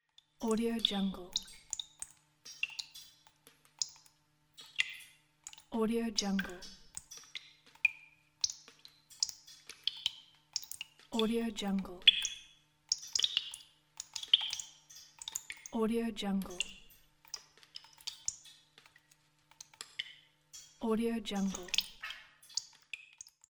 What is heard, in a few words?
water drops